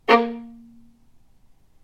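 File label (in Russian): звук ноты ля диез малая октава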